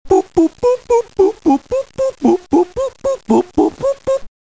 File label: boop